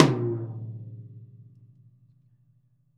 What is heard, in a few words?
Interactive Drum Kits; Sonic Reality; 90s sample cds